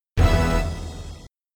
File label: Big Points, Multiplier & Bonus Chord, pinball; Judge Dredd; Bally; arcade